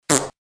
Fart

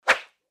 Throw

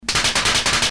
Slapping

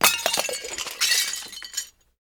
Glass Break; Ed Edd n Eddy; Adromulus Pack; EEnE

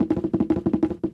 Bongo Run lower; Ed Edd n Eddy; Adromulus Pack

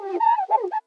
Window Wipe; Ed Edd n Eddy; Adromulus Pack

